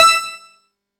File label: quest accept; Game Events